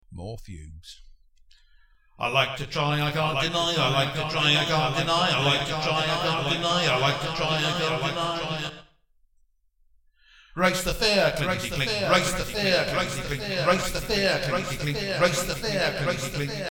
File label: fugue